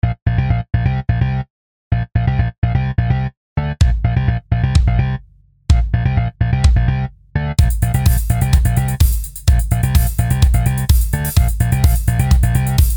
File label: Duel Groove